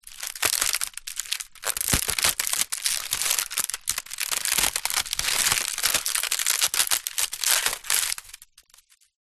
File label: foley basket wicker crush snap stress light